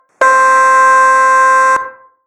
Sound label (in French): son bi trompe; klaxon